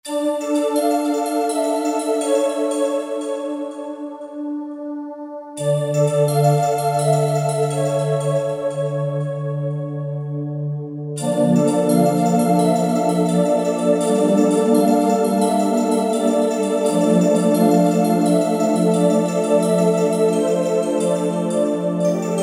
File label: Heavenly Eko; Roland SR-JV80-09 Session